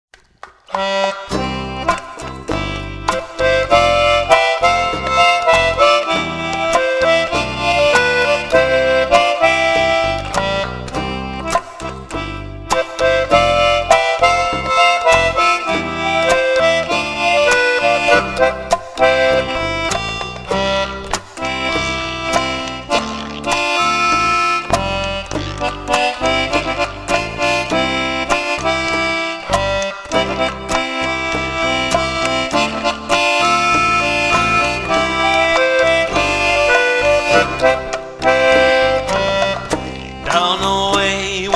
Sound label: concertina